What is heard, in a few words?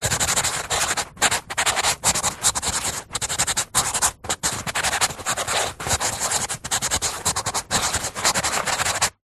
marker pen writing on tissue paper; office